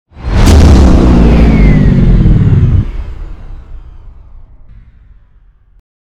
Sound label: hyperspace end, shuttle